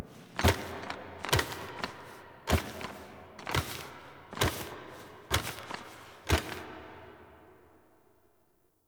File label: Foley Exit; Teshin; Warframe; Cinematics; TheWarWithin; TWWNursery